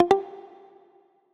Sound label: Common Achievement; Friday Night Funkin' Times and Tribulations